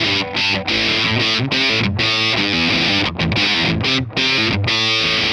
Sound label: Hard Rock Guitar; Guitars